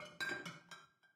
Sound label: beat bottles; goldentavern